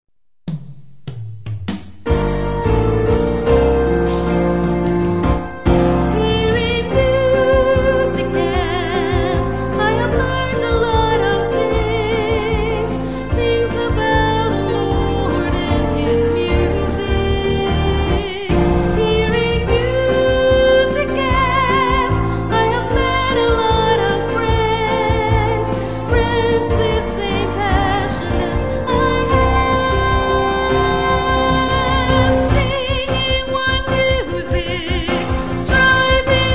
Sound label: jingle voice minus one drums